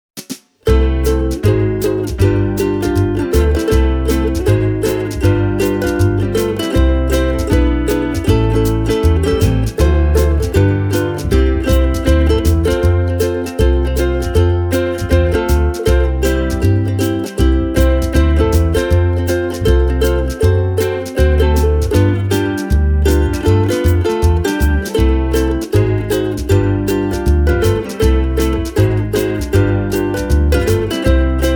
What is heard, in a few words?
Baton Rouge V4 S Sun